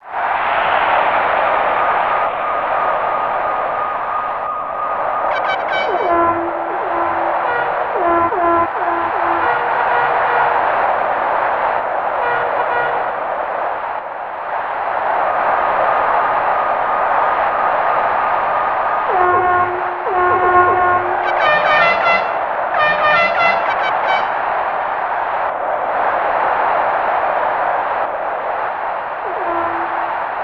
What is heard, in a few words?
In-Game Ambience; Ken Griffey Jr. Winning Run; SNES